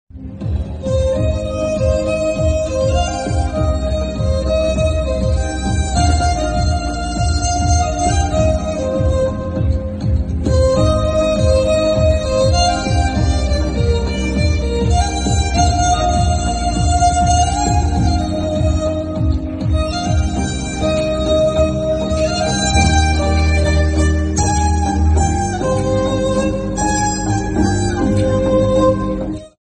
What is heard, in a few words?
violin